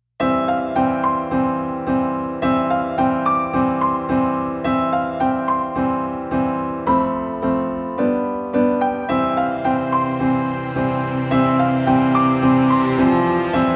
Cute Romance

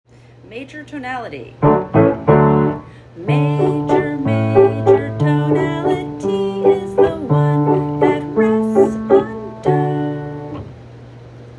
Major tonality

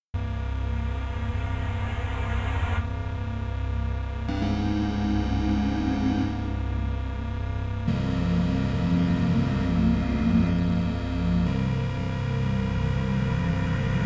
Thunderstorm

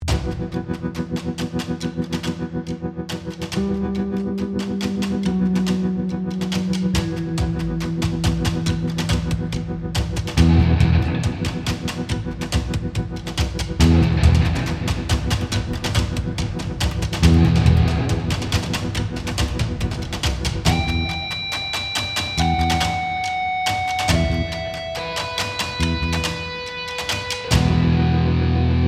Moderate, Synths, Bass, Distorted Guitar, Epic

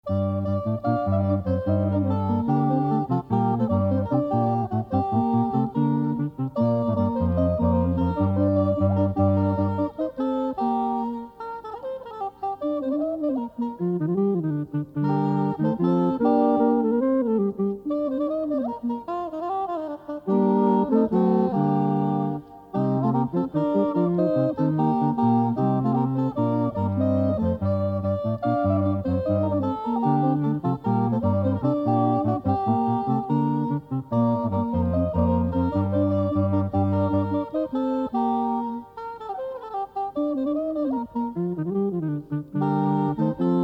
Basse Dance

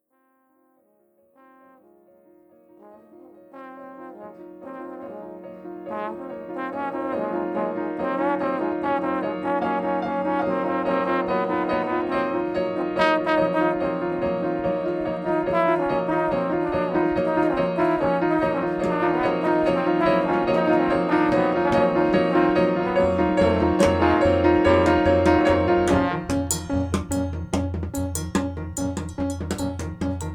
Quartet improv

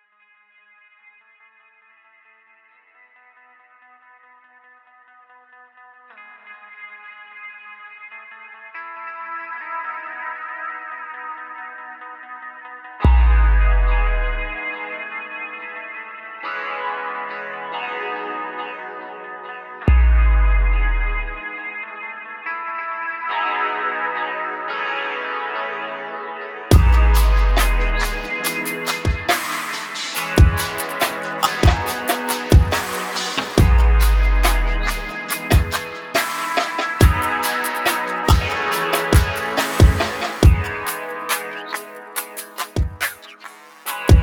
Chill, 70 BPM

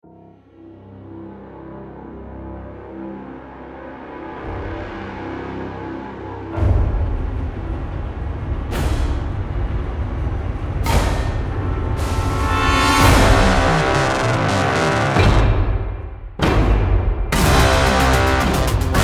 Cinematic Music

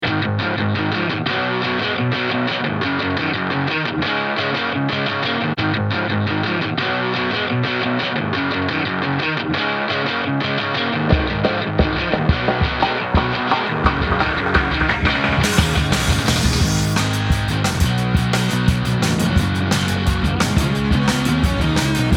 Garage rock (no vox); High Energy Garage Rock

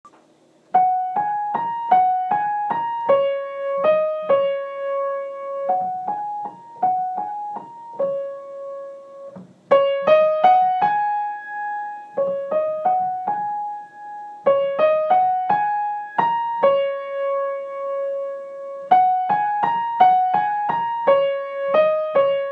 Echo Waltz Primo Part

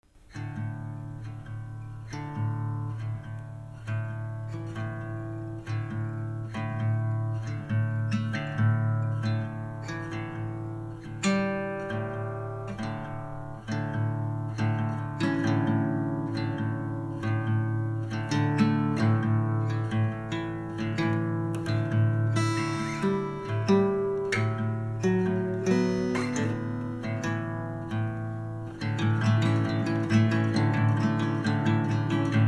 Acoustic

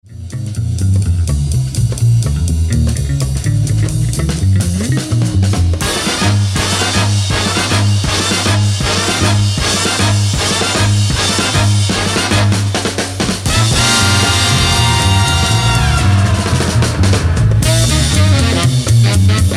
Instrumental Version

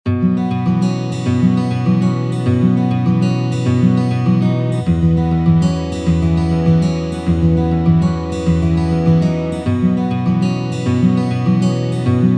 Bright

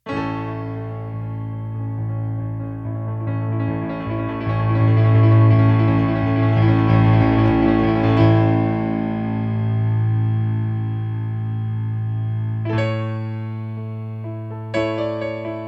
Piano

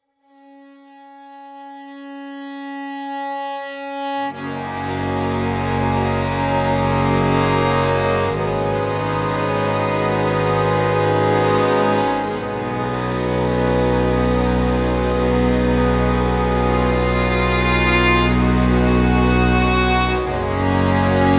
Instrumental; Inception; Prelude

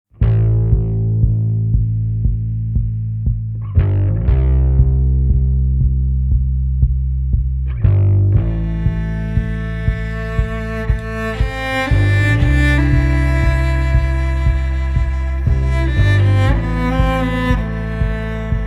Cello Intro

